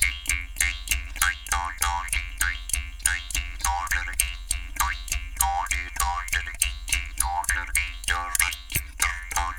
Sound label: JAW GROOVES, Didgeridoo